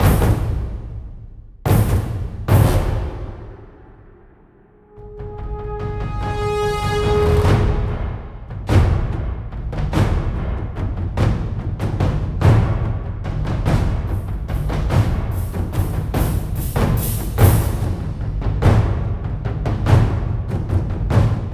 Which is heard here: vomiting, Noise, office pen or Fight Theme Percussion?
Fight Theme Percussion